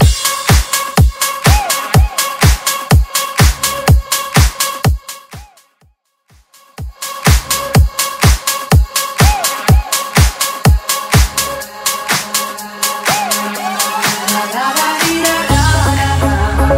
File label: 90s House Club Mix 124 Bpm; Mainstream VIP; Vegas Edit